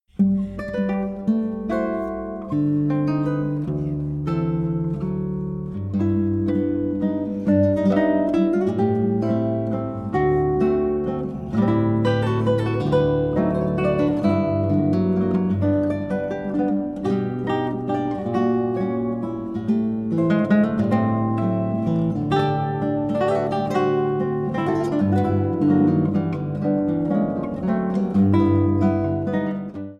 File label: Waltz